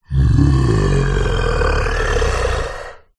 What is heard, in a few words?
horror zombie male groan growl